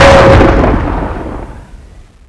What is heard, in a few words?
pwrench big hit, weapons